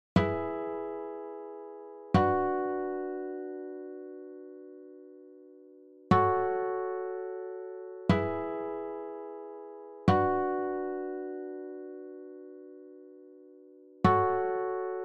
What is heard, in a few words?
Guitar